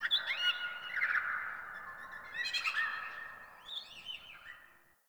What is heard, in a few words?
Bird Tropical, ambience; One Offs; Glades Of Andurin